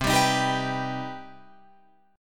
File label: C Minor Major 7th down Guitar Open F; strummed; JGuitar